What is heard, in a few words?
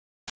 step